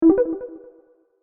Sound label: Infographic call answer notification